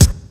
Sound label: original kick sample E key